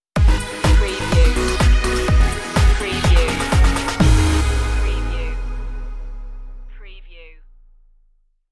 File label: pumpd up stinger